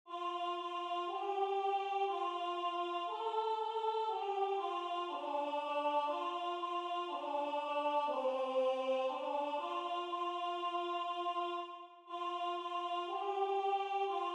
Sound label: Soprano